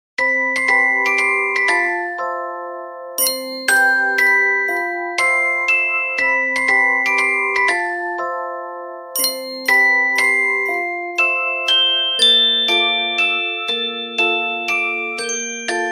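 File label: Peaceful Dreaming, ringtone; Samsung GT-M5650 Lindy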